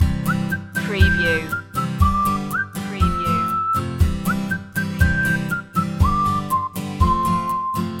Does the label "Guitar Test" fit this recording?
No